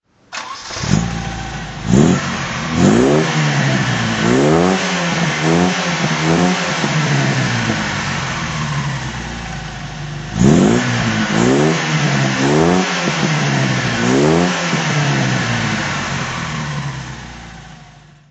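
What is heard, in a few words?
volvo 142 throttle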